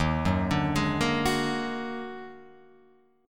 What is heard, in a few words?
D sharp Minor Major 11th D sharp, Guitar Open F; arpeggiated